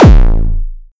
Kick Dist Hard

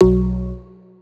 Organ 174bpm Bm, LIQUID; DNBACADEMY

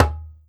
Perc Djembe Bass; Circ Afrique V4

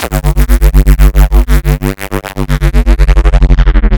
Sound FX LFO ooo Granular; Granular Sumo

